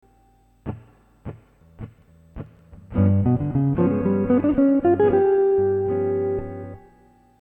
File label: modern jazz guitar licks